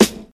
dark sounding acoustic snare sample a key, acoustic snare sample tuned to the A note